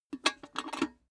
cash box metal lift handle; maschinen; soundpool

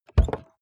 car bmw e46 interior door rear open short; Cars

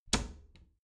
bathroom cabinet door close regular

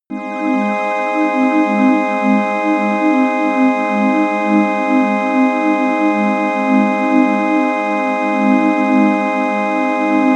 organ air

